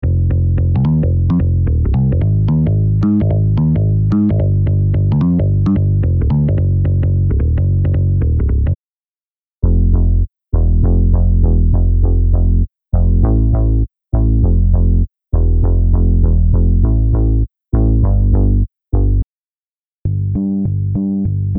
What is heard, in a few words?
Soul